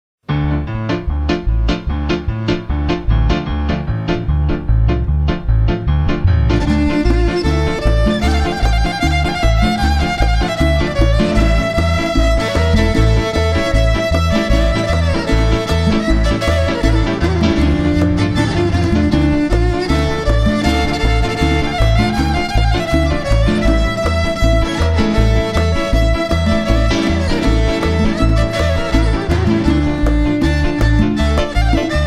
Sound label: Rumanian freilach